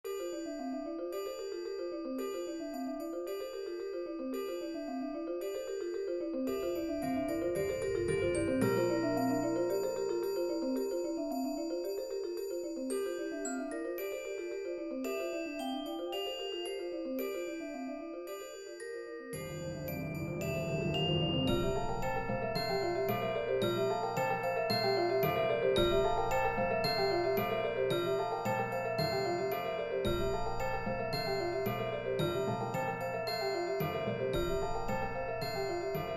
Percussion Ensemble 9 Players, Instrumentation: Bells, Xylophone, 2 Marimbas, Vibraphone, Chimes, Timpani, Triangle